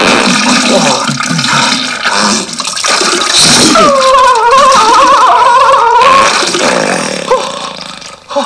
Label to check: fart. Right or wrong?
Right